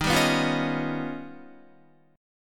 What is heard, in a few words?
Eb Augmented 9th Eb down Guitar; Eb+9 strummed; JGuitar